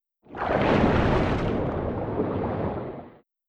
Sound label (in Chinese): 急流音效; ambience stereo